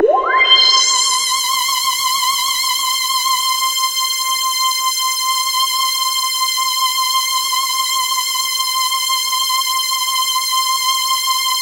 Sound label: C5, Synth Line; 90 s Sample CDs; NiceBeats; SynthLine VOL-18